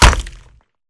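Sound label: Bullet Light Ballistic Concrete impact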